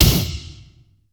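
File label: DRUM HIT; Best Service Advanced Media Trax Building Blocks; FAT ROCKA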